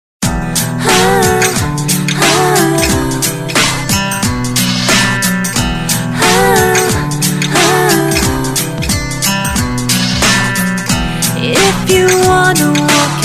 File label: Anymore; Samsung; GT-C5010 Southeast Asia